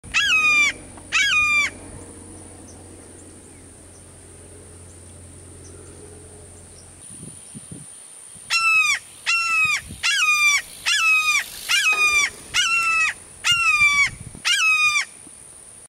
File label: Powerful Red shouldered Hawk Call